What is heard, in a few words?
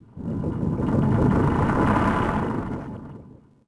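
creak metal large; Star Wars Obi Wan; XBOX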